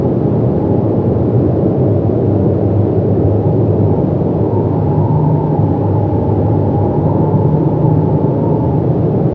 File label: Noxious Cave Amb Loop